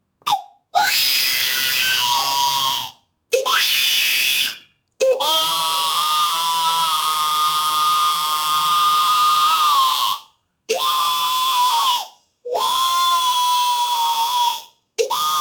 audience in, shouting; yeti

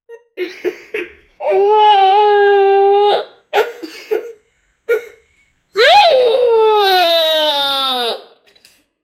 14 year old boy about, vomit

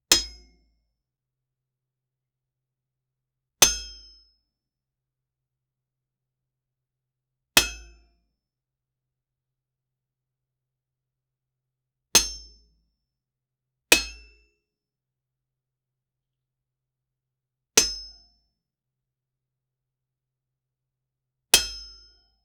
the sounds of a pickaxe, clanging

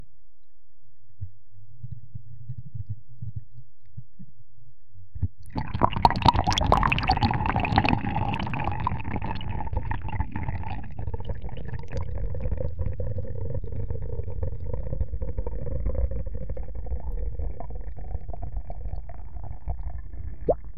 low pitched muffled repea; sound effect; body horror

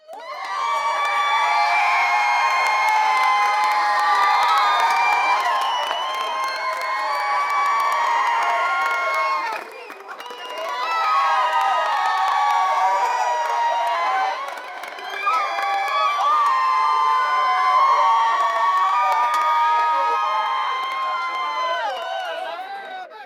crowd cheering and applau; event

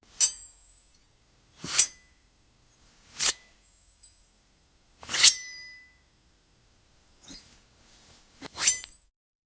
medieval sword unsheathin

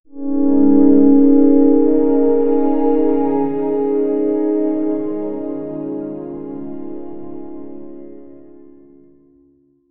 resonant rubab melody; ethnic

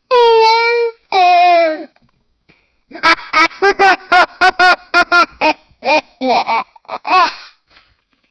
clapping cheeks; sound effect

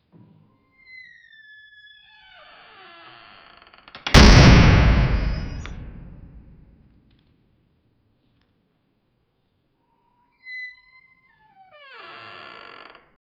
a slow door crackling and, jumpscare

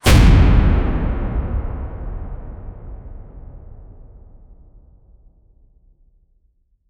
dark cinematic esports lo, transitions

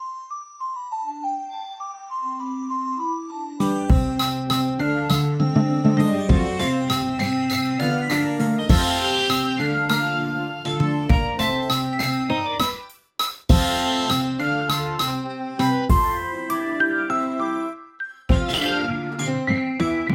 mini game greatest hits; children's games

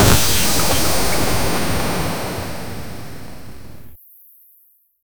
emerging chiptune hum

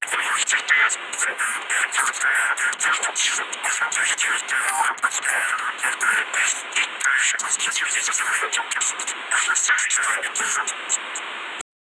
radio sound comes from a

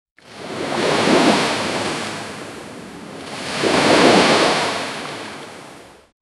gentle pronounced double, marine

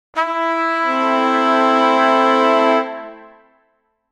a triumphant brass fanfar

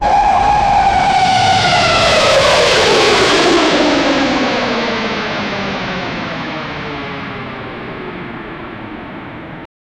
airbus a380 take off

